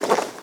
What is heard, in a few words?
armor light; smite; chiron